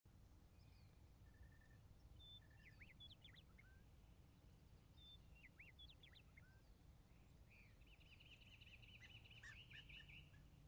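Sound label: on the autobahn outside the city with a lark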